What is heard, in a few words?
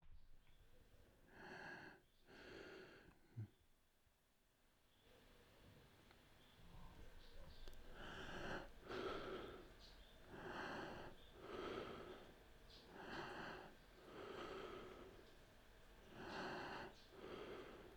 Young Male Heavy Breathing